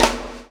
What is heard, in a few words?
CHAIN; Spectrasonics - Backbeat